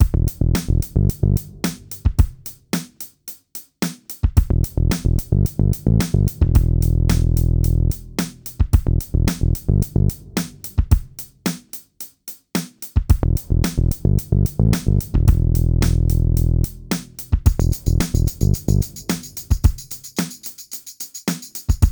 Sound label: Groove